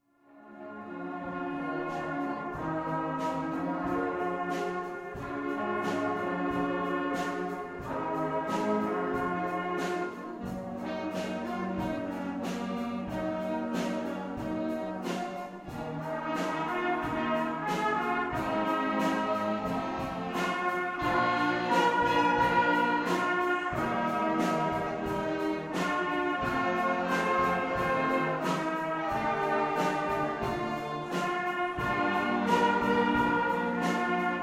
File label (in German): Jugendblasorchester; der Musik&Kunstschule